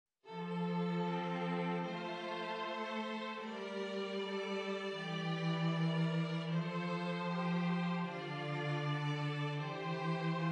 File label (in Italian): esercizi di contrappunto semplice A tre voci; 3 gattung; Ronge